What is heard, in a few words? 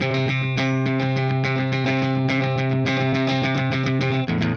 Clean Funk Guitar